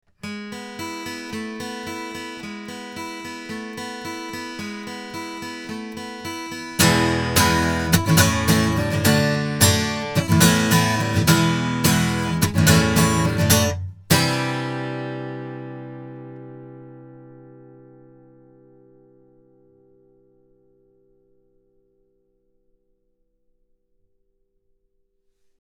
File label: Rode NT1000 guitar